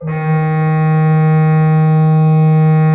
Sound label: D2, Cathedral Organ, HOLZGEDAKT; Propeller Island